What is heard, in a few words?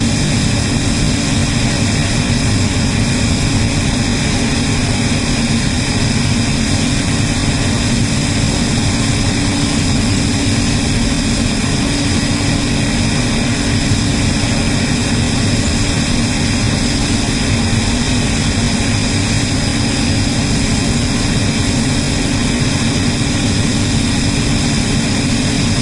mig29; mcheli